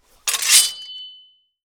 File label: sword draw